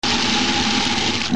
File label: Running Water